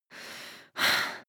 breath female active aroused

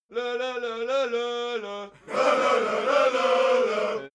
crowd musical bawling

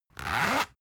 zipper close

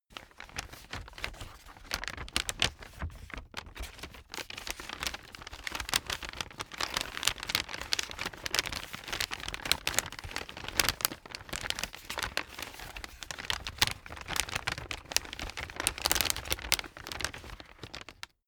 paper wrapping handle long

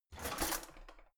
old drawer out half hard

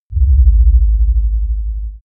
bass impact slow distortion

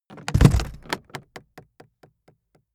aluminium case hit deep

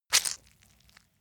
leather impact mud soft squeeze

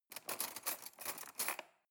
cutlery cake fork drawer handle

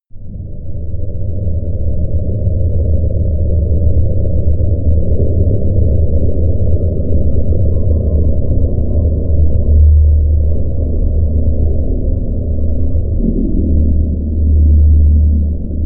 drone

